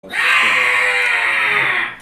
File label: Troll screech